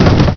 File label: concrete, debris